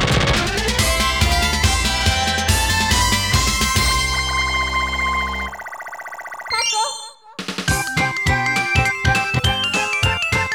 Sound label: Round Clear; marvel land; Mole Clear